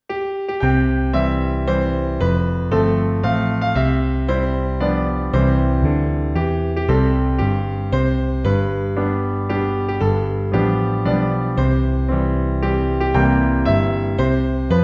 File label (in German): Zum Geburtstag viel Glueck C Klavier